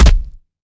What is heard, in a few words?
Impact Shank